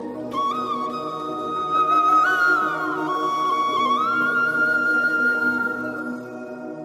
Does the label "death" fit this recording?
No